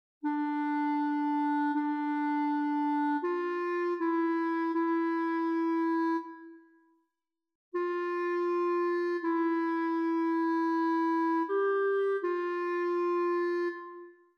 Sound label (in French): CLARINETTE; Psaume 129